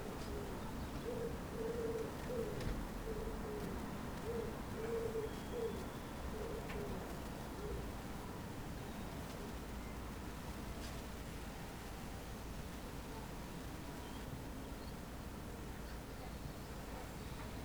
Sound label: desk dusk ambient mono